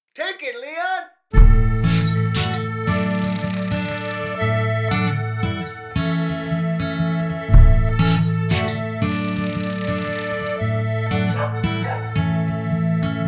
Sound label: Crows Waltz